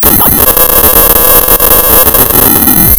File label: pop, tearing paper